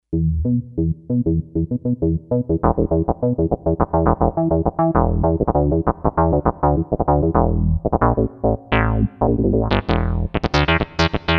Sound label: Dark Puls, Kawai K5000S